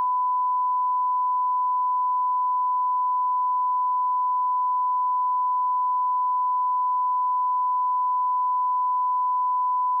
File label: Censor Sine Wave; gamerip